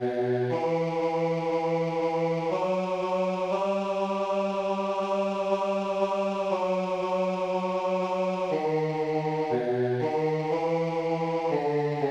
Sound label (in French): basse; aspiciens